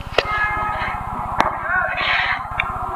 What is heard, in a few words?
bugle; ghostrec; i'm dead